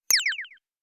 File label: Elec crystal